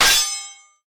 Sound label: Block Melee; boomerang fu